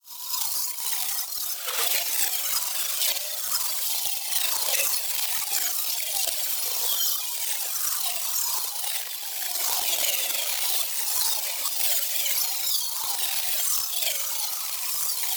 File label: PARTICLES SMALL FAST Atomic Meteor Shower; NEON; boom library